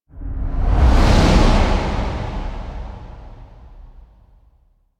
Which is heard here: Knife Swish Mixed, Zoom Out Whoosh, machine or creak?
Zoom Out Whoosh